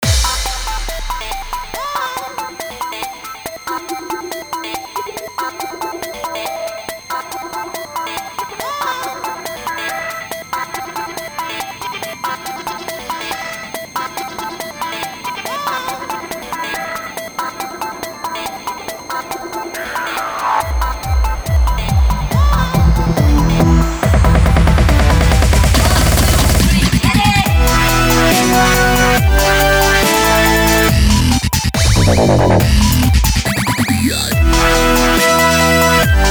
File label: Dubstep Trailer